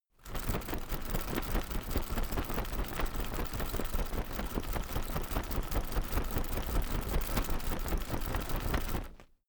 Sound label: umbrella telescopic shake fast soft